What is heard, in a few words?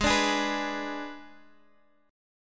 G sharp Diminished, Guitar, Standard; strummed; 16,17,18,16,x,x